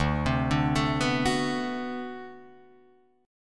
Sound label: D 13th D, down, Guitar, Drop D